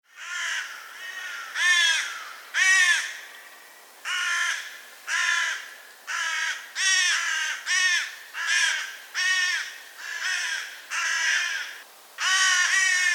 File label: Corvus corone call Trupp Flugrufe